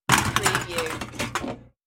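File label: Can Rummage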